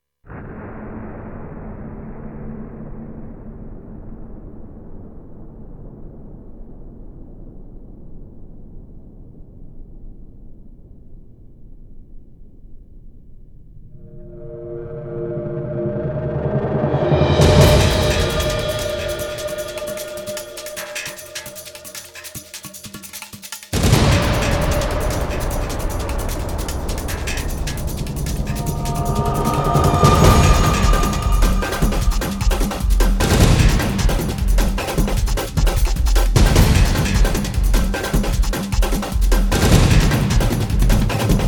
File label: drums and percussion